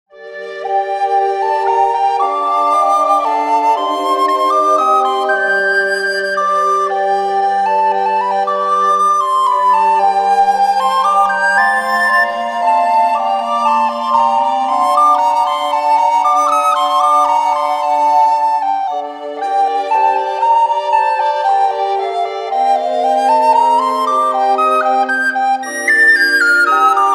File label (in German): FLÖTEN